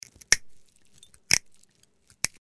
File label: switch